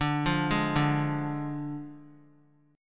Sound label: D Diminished D; arpeggiated